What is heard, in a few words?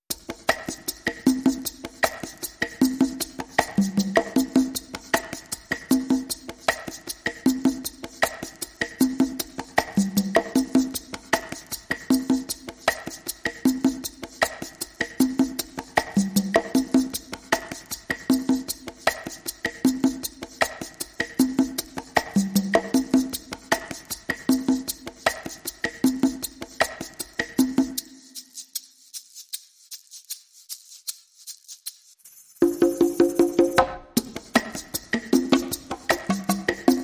Drums Only